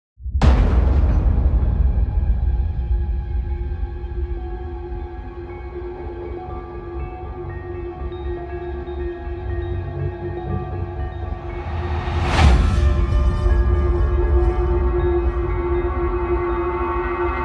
colossal aggressive fear epic scary hybrid horror music